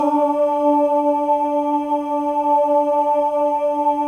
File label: MALE OHS; Spectrasonics; Symphony of Voices